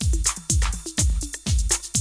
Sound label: BREAK; Zero G; Man Machine